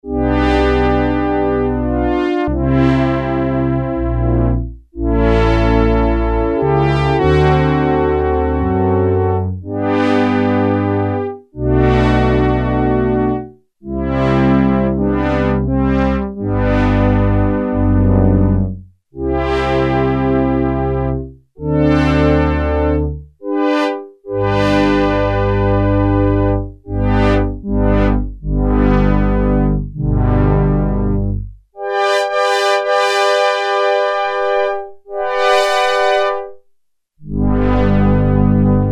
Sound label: Slow Orchestral Brass, Prophet-5; Sequential Circuits Prophet-5 (Ver.3); Factory Patch; File 2 Programs